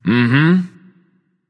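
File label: nonverbal agree; smite; voicepack; merlin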